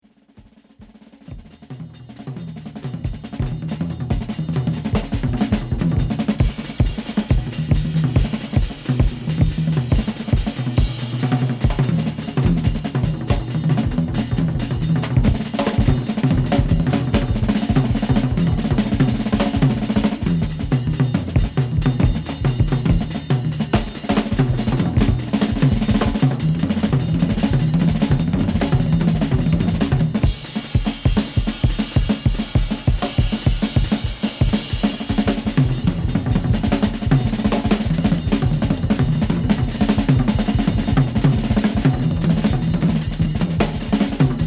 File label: Samba